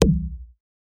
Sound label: impact shield impact small; Robotic Lifeforms 2; soundmorph